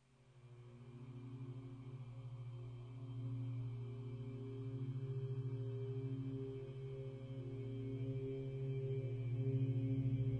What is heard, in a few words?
delta sleep system